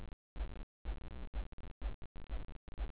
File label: silence